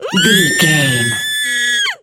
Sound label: voice female scream